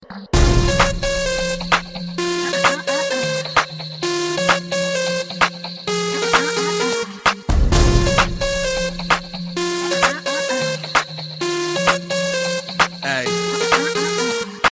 cool beat ringtone

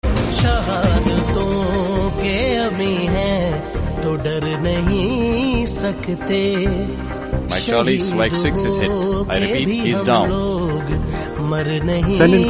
national song

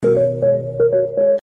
ring; apple

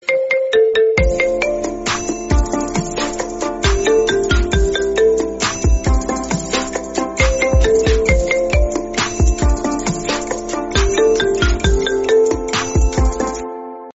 smartphones ringtone

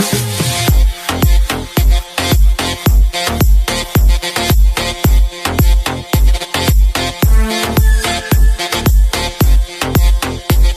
arabic ringtone

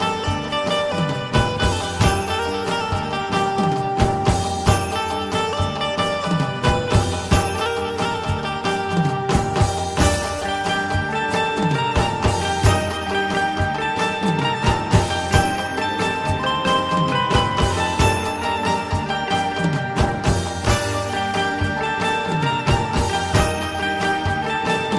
dandiya instrumental ringtone

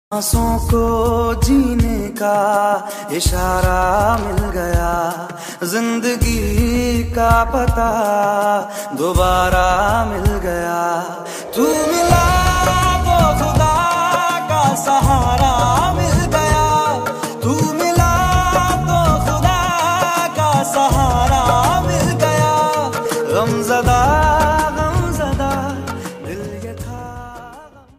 bollywood emotional ringtone

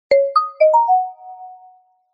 iphone x sms ringtone

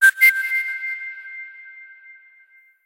code word ringtone

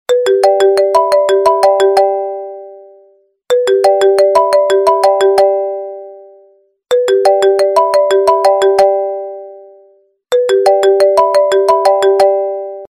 iphone love ringtone

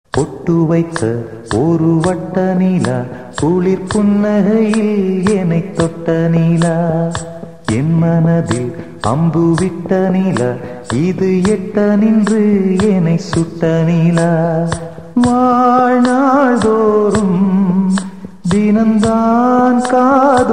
tamil ringtone

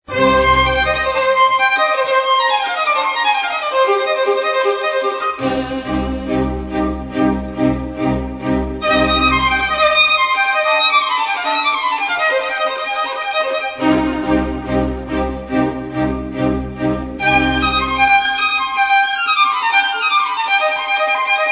classical ringtone; four seasons

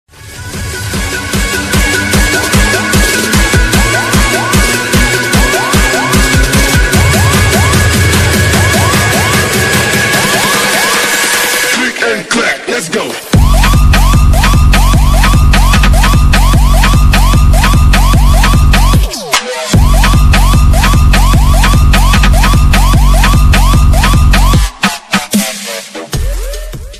trance lover ringtone

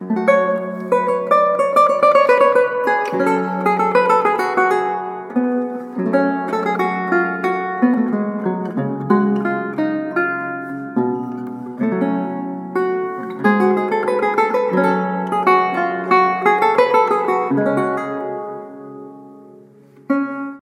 romance ringtone

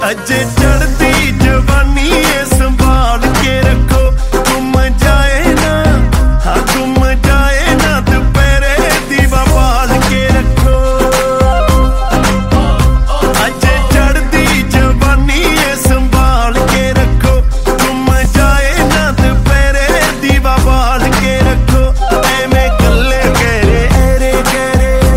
best punjabi ringtone

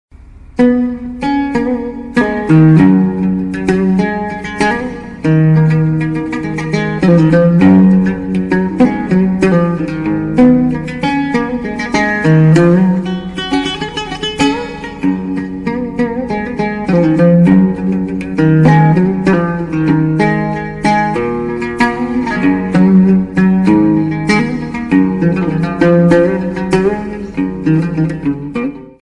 oud samsung ringtone

